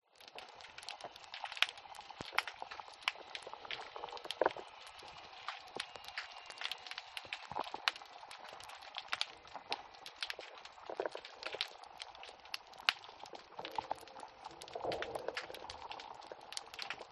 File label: underwater harbor boat pass